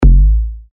Kick A; techno; Yoshi

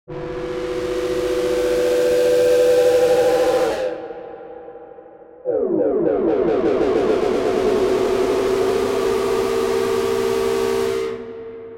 Sweep

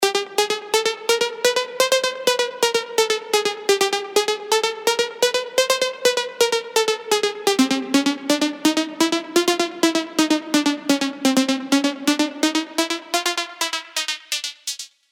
Serum arps; Guilty

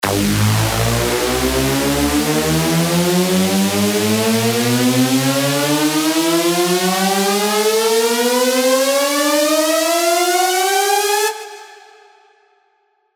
Uplifter

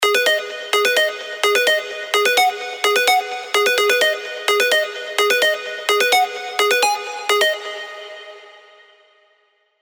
G#min (128 BPM)

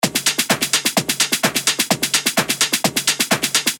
128 BPM

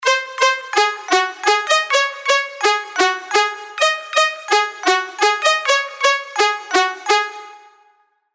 PROG ARPS G#m

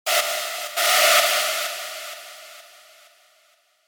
Rave Lead WET (Em 128 BPM); FUTURE TECH KITS

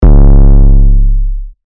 SERUM 808 C#; SERUM 808 VOL4; Purple

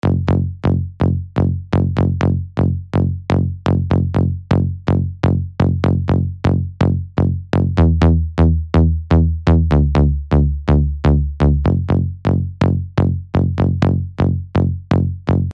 Kit Drop Bass DRY (F#m 124 BPM)

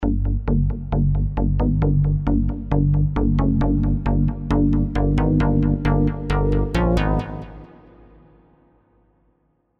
Stab; Nightmare

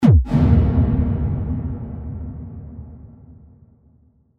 Impact

